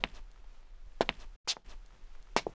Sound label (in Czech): kroky kněz; Mafie 1